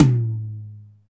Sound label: TOM TOM, Real